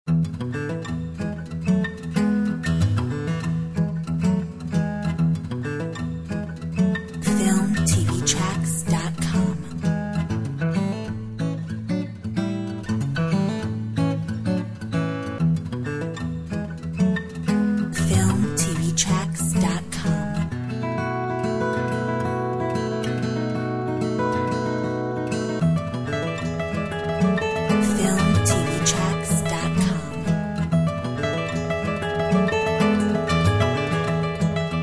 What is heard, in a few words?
Road to Dublin Gtr only